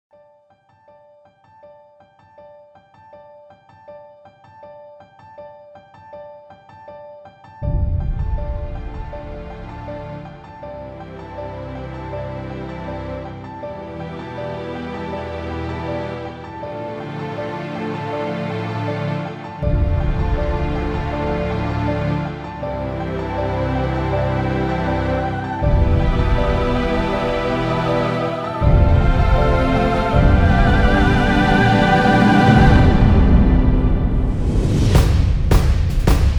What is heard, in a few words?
Epic Trailer Uplifting Drama